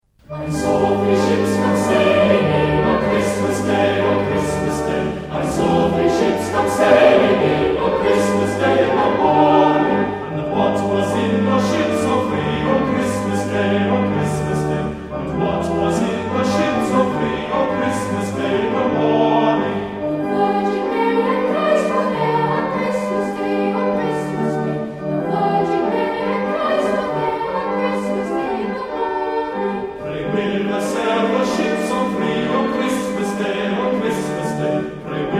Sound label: i saw three ships